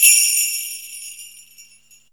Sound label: CHRISTMAS, BELLS KIT; USB Soundscan vol.27